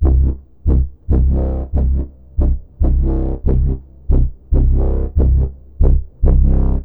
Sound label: Trance Bassloop 140bpm, Bass Arps